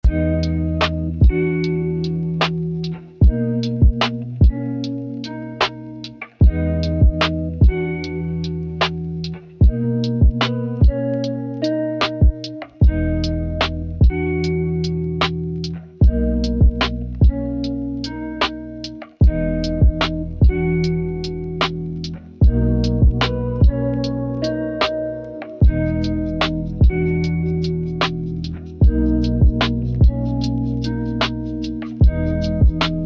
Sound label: chill lofi jazz